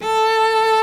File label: A3 CELLO; InVision Interactive; Lightware VOL-1